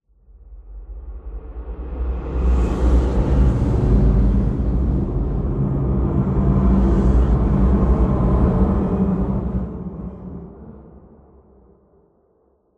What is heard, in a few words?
amb unique lobby; Half Life Alyx